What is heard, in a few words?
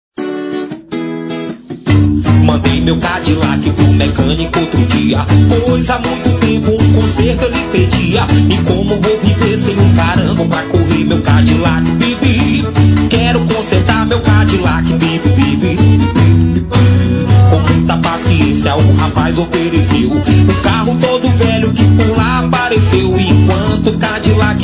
Forro; JOVEM GUARDA